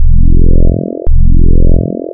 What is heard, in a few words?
Add Partials Range Odd, wavetables Additive; Architecture 32